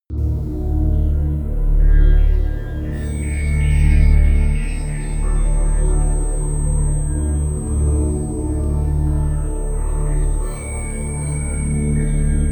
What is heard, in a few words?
drone talking and squeaking